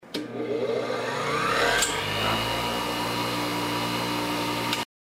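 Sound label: electric grinder; bench grinder; elec grndr new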